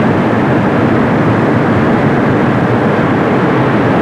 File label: steam pipes loose; gamerip; Shattered Horizon